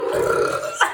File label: burp